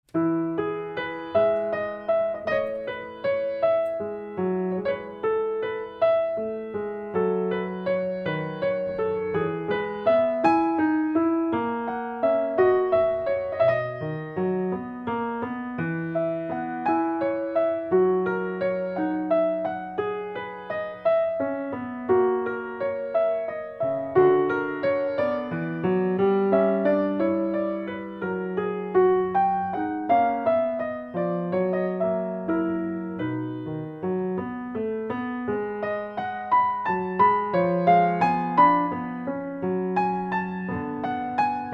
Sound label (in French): prelude en mi majeur, piano; js bach